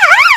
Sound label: yelp, danish siren; vcmod; els